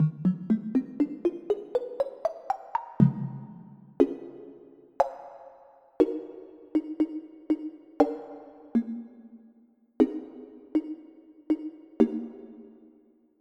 Rosewood Clave

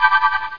Chime; WARGAMES

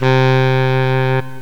Alto Sax; Reed

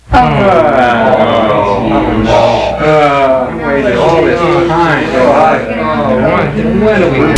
Moaning Crowd; Soundsation; Human

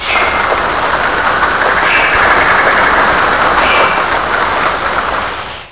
joy rain; My Science Fair

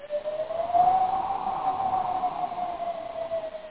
howl

